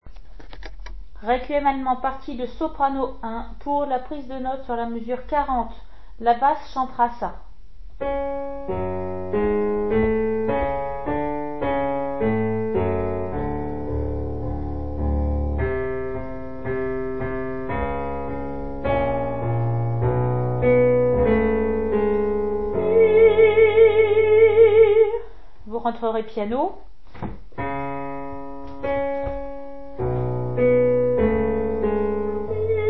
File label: soprano; Brahms requiem allemand; mesures 33 à 46 prise de note